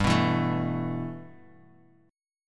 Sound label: G 6th Add 9th G Bass Standard, chord; G6add9 strummed